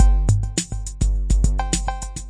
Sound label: 808 cowbell gentle jam, 104 bpm, drum loop; 101 to 110 bpm